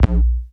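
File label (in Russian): кнопка сухая резкий близкий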